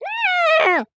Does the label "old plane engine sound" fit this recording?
No